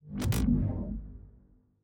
ui focus push

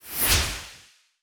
ui lock

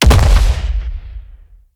grenade

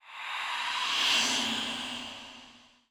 cheer

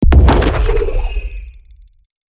dark hole boost

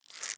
luna arrow

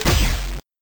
atk cannon 8bit

